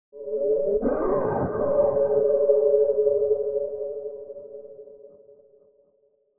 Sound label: space